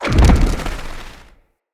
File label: flock shot; Enemy; Abilities; Thawtide Hunter; Sinecure; Ranged Hunter Thawtide